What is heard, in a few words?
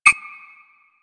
Hub Concourse Computer Beep; Warframe; Ambience; Tenno Hub Concourse Fragment